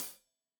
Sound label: Hat Tight, Hi-Hat, Cymbals; Deeply Sampled Drums; Stereo